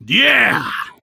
Bar Cheering When Somebody Orders Drinks

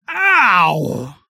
Walking on Hot Rocks

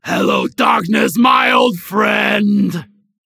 Bar Cheering when drinking

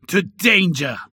Bar Cheering when drinking

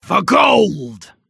Bar Cheering when drinking

